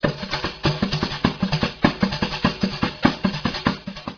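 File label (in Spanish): pandereta; tradiberia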